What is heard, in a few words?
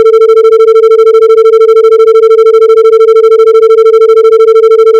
Autogenerated sine 120 cents